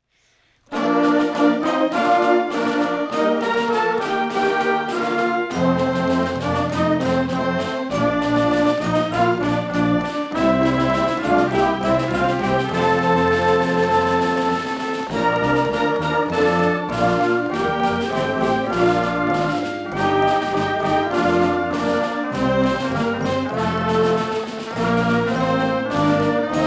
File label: Concert Band; J D Alma Mater